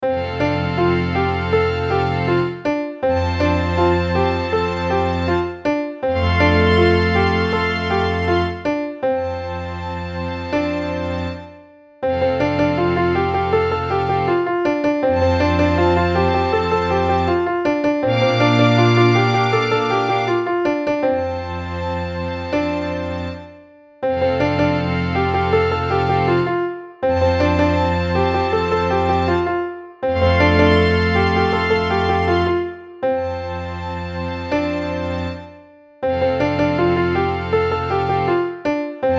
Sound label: Outside Cheerful